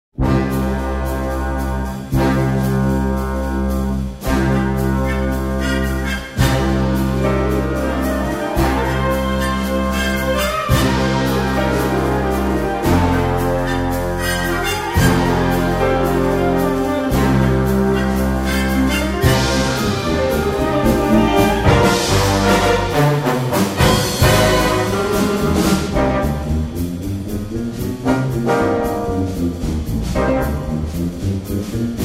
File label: Fast Swing